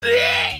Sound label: puke